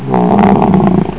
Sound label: pain; vomitus